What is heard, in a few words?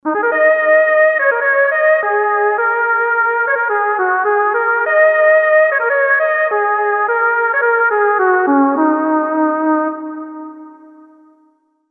Air Lead, LEAD; ROLAND SH-201